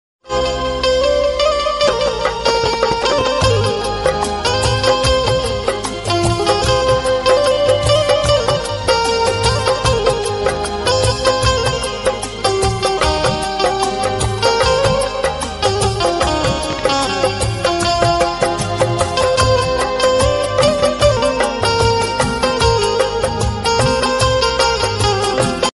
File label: romantic instrumental